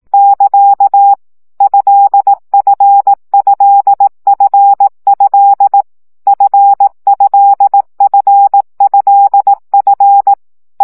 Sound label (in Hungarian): CW gyakorlas f e1 15 baud